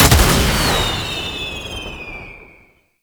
Tank Missile Launcher; Enemies; Weapons; Warframe; Lasrian; LasrianTank

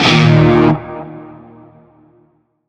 Shawzin Chromatic Chord; G; Warframe; Narmer Shawzin

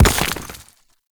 Mech Step Surface Dirt; Vehicles; Step Surfaces; Warframe; Nechro Mech

